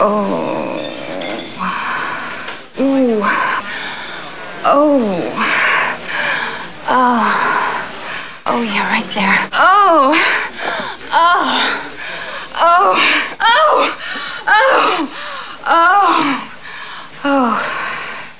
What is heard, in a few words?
ORGASM